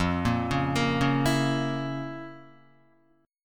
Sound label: F Major 7th Suspended 4th Sharp 5th F, Guitar; FM7sus4#5 arpeggiated; JGuitar